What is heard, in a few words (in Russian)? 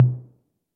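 том приглушенный с эхо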